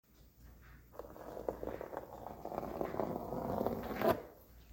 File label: Wall pins